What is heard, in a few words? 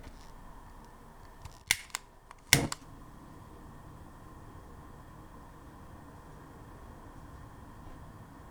Gas cooker